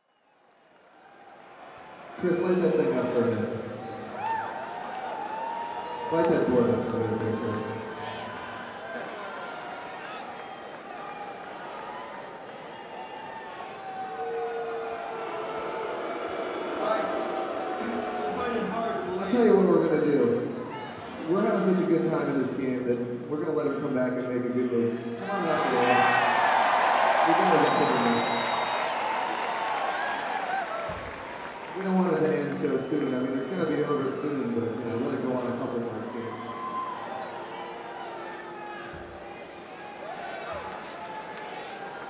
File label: audience chess move